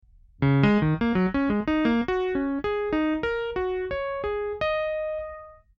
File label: cp80 up dry